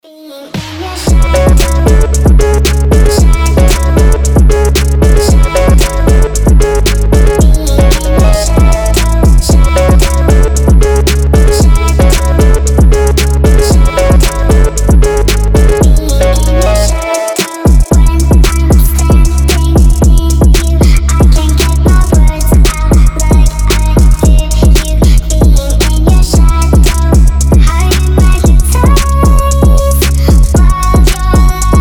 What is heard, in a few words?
shadow phonk remix